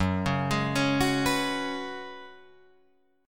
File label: F sharp Suspended 2nd Suspended 4th, Guitar Drop D